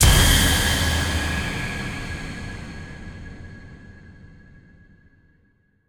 big Win Text Change; halloweenjack

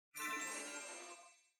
win Flash; cashomatic

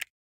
buttonHoverAway; superstriker

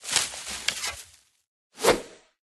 symbol Robin Bow; robin hood

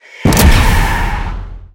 win mega win start; superstriker

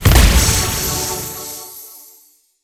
intro free spin start; fairyred

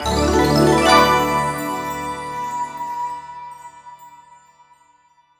win Medium; imperialriches

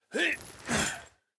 chest Pick Up; robin hood

